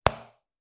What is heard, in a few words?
Body Slap